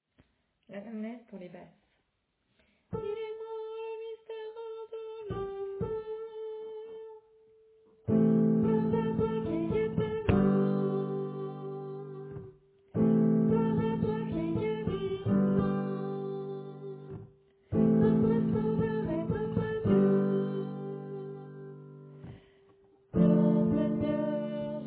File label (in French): Anamnese Basse